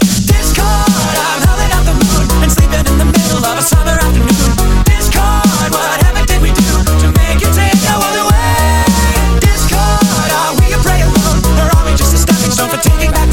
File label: eurobeat